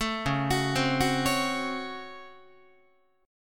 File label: Db Major 7th Suspended 4th Sharp 5th Db Guitar; 12,9,9,10,10,12; DbM7sus4#5 arpeggiated; JGuitar